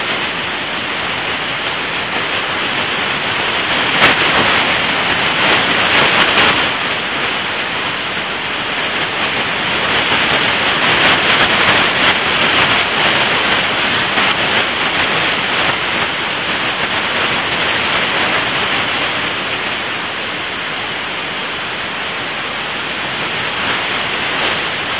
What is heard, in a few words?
jupiter l burst hf